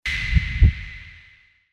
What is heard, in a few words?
Creepy Percussion